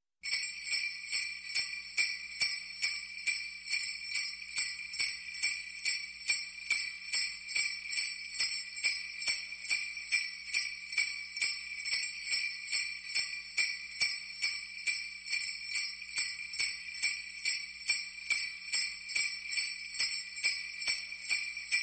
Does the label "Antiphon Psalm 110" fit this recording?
No